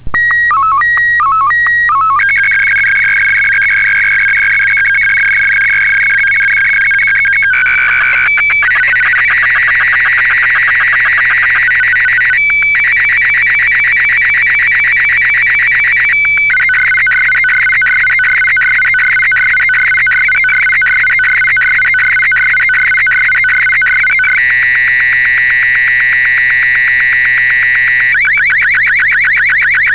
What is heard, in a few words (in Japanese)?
SSTV, AVT QRM; 約１８８秒; ｱﾐｶﾞAVT QRM